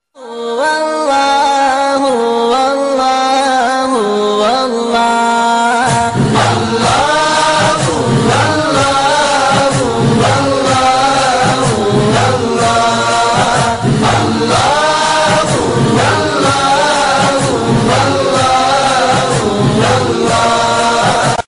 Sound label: New Islamic Ringtone